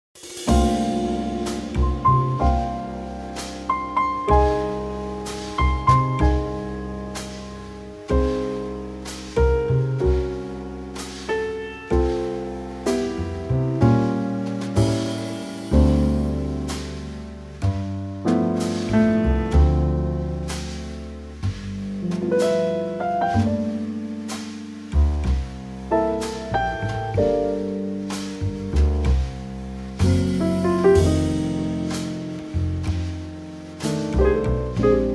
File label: Trio; F Trio; key - F - Real Book Changes; Superb Trio arrangement, sticking to the Real Book changes, of this classic jazz standard